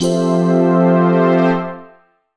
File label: PAD, Drum & Bass, 160 BPM; Ueberschall; Drum & Bass Resonance